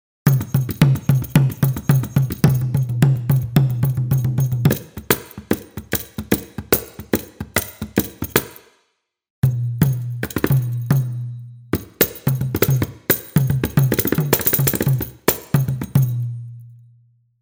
India Kanjira

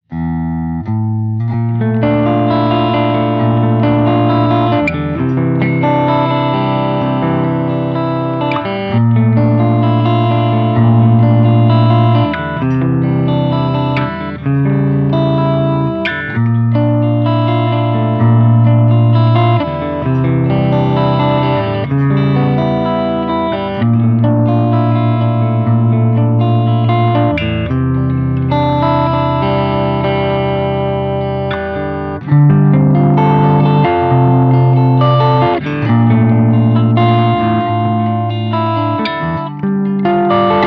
cleanish

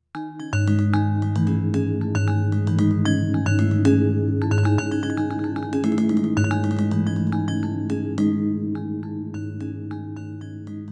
ROLAND SPD-20 PRO Indian Perc Kits

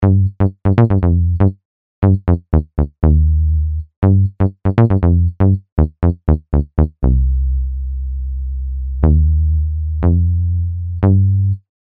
EX 8000 Bass

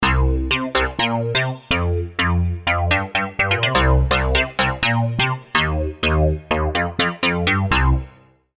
Vectron Player Bite Bass

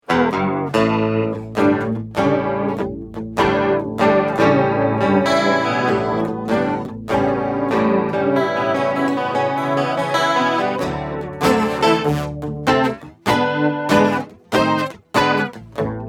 Rain Forest; LAVA Music ME-4 Carbon Preset

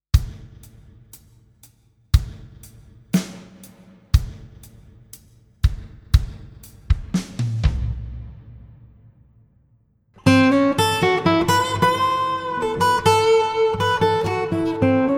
RMX16 Hall

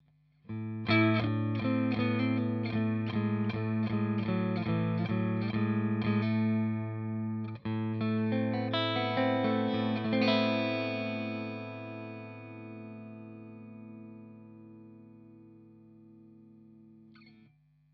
Middle SC Clean

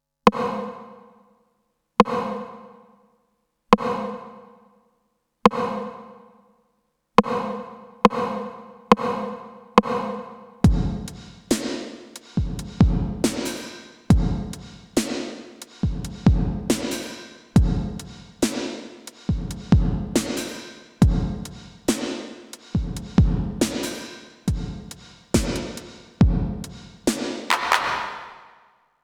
TR909 LIKE; OTO BAM; PRIMITIVE; QUAD EIGHT; CPR-16

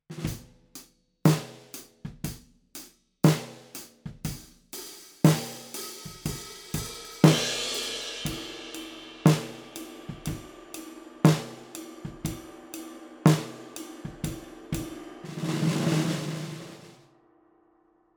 Neumann KM184 Drums OH XY

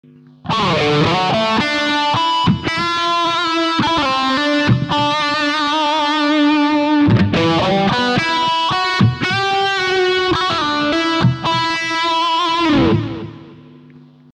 heavy solo

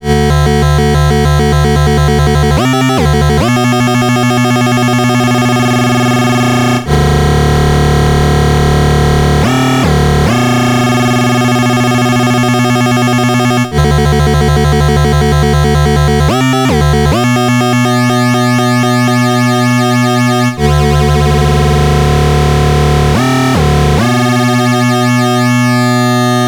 Behringer Neutron Tone Mod OSC LFO Key Sync Rate

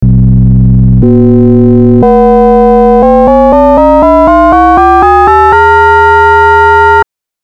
logFM

